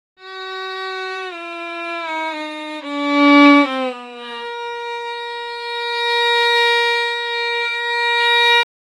violin sound; strings

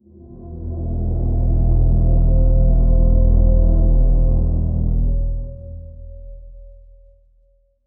egyptian inspired horror

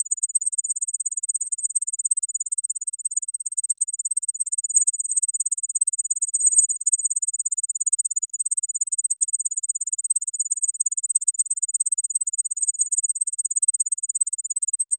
futuristic, sci-fi

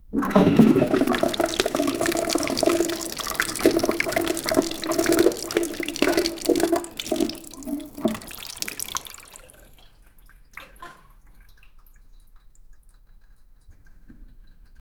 pooping in toilet

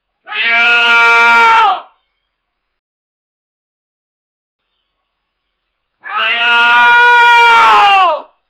a sharp male vocal sound, shout

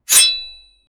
sword slash with a strong; combat

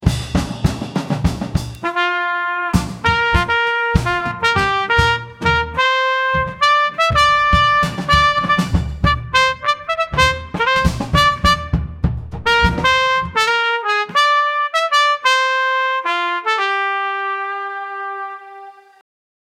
blues song with a trumpet

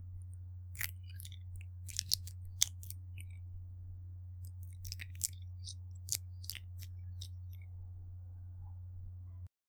grabbing food from other, snatch

